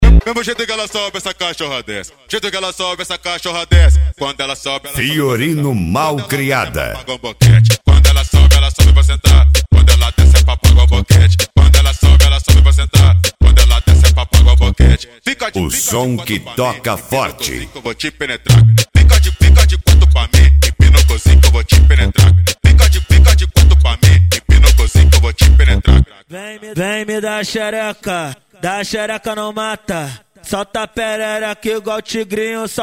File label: mega funk bass